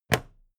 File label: plastic clasp close heavy